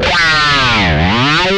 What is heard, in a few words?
whammy fx, guitar